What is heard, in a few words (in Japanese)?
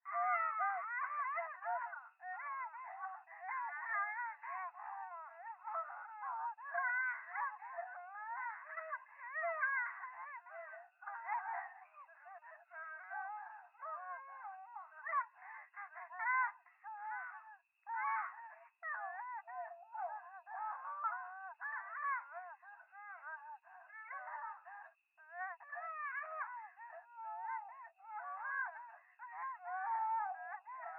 電話 シャブ喘ぎ 山奥夜